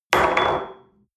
espresso place on table hard wobble, restaurant cafe